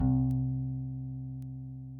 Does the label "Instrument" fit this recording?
Yes